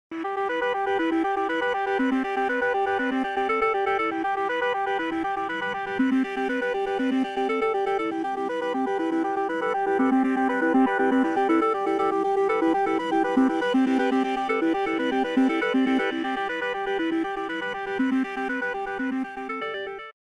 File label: nada dering iphone